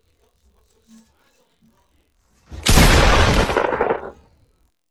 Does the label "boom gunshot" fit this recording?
Yes